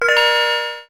ting ting message tone

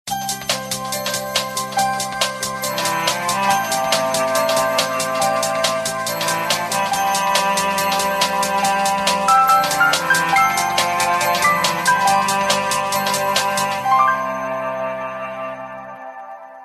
samsung omnia ringtone

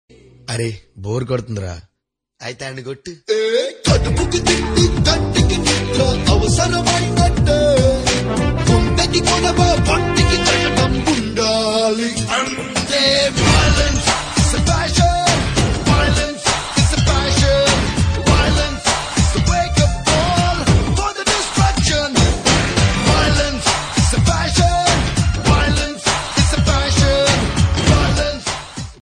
telugu ringtone

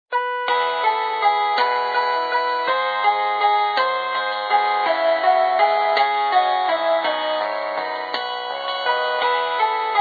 polyphonic ringtone

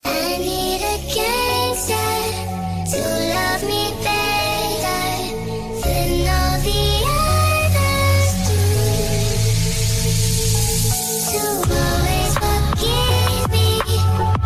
gangsta trap remix ringtone

